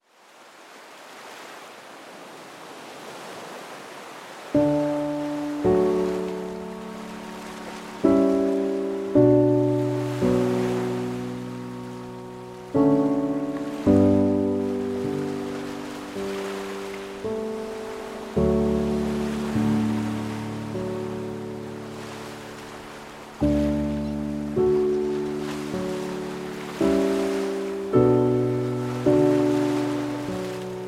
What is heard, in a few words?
Morning Piano Ocean Waves; آهنگ های بی کلام دریا